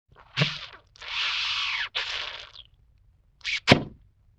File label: Sloop Poink, Vintage Cartoon Comedy Vocals